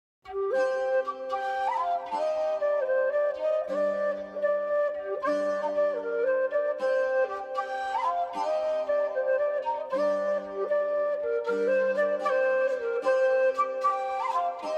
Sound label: Instrumental